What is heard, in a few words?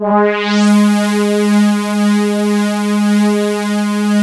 DRONE MULTI ZONE G#2; Best Service Alpha Dance vol.2